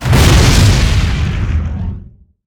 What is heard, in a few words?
Fireball Lava Launch, Weapons